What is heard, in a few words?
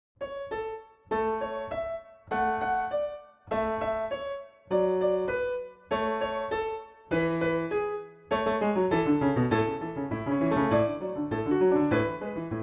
Piano